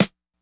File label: snare; funk